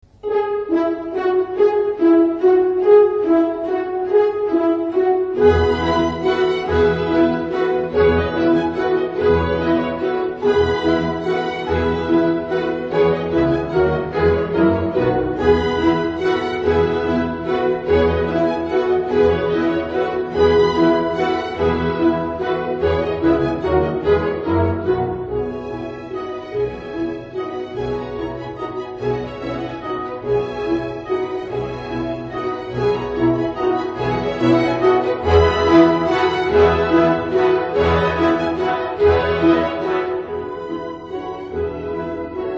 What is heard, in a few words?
Carillon